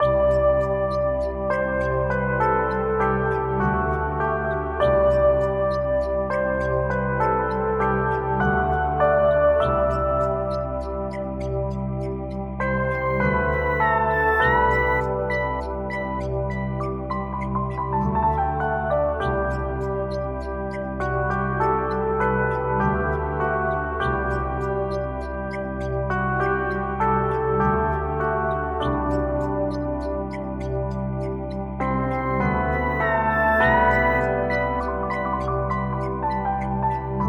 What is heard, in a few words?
Ocean Keys